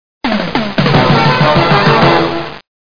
ROC ROLL, MUSIC

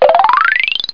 knobs, Etch A Sketch; Etch A Sketch Quick Tour

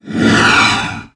MENU WSH; Forsaken

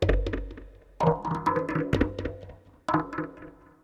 PERC LOOP ETHNO 125; eJay

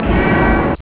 miao

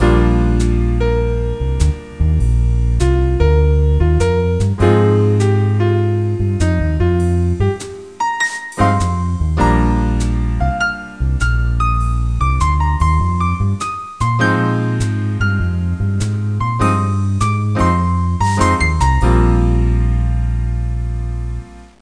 LOUNGE; Goosebmp

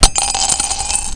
Dissolve Money Brick; Greebles 1.0

